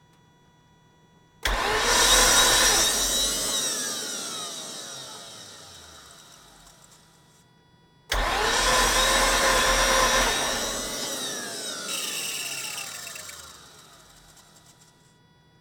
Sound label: rx 8 start attempt; starting issues